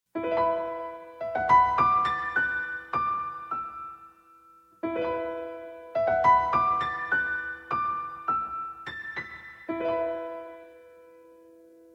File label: tone long